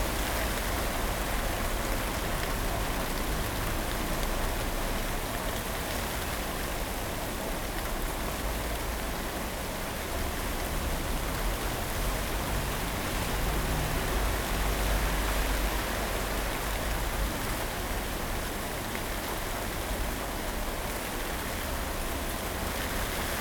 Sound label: rain street; 99Sounds; Rain And Thunder